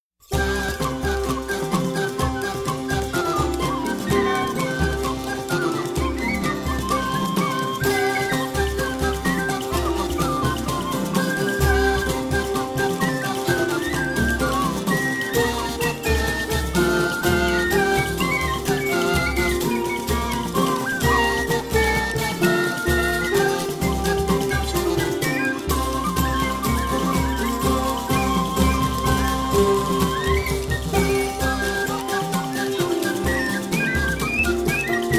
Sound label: Medieval Dance; Robin Hood: Prince of Thieves